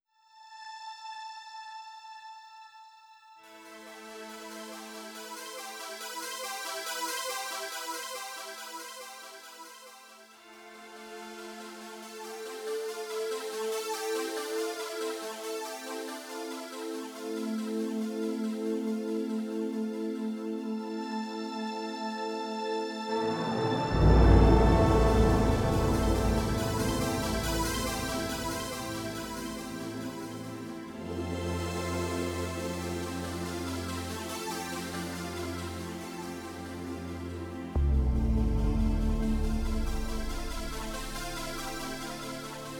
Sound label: Synthetic Ambience; More Than Mario